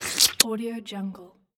a finger licking

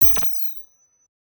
impact small needle beep; robotic lifeforms 2; soundmorph

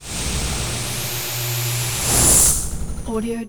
static interference noise; soundmorph

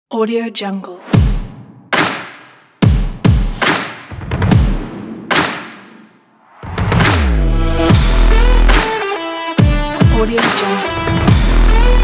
the indie rock

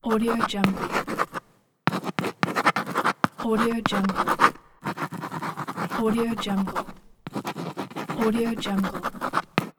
writing with pen

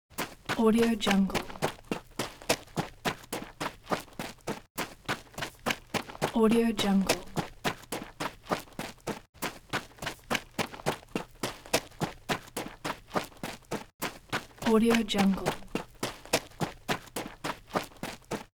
running steps

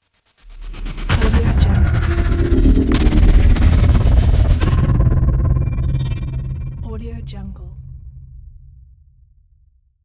transformation logo

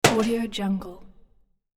gun blast single outdoor; hollywoodedge

